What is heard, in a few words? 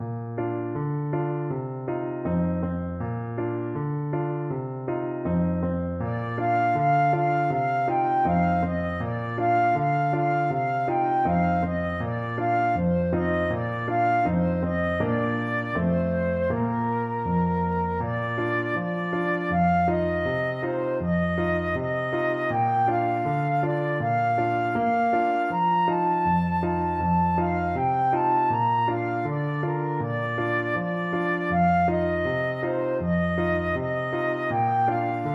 voice flute